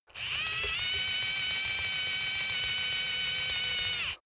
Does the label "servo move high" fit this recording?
Yes